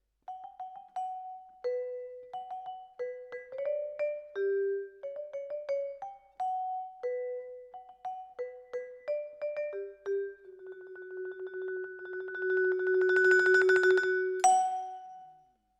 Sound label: Vibra Close